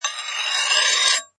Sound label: Knife Scrape, Knife scrape or sword scrape 05: Blade being sharpened or slide against other me; Blade being sharpened or slide against other metal; Knives; Combat; Knife Sound Effects